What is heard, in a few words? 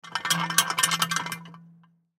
Metal Chain Gate Shake Soft; Doors; Blastwave; Foley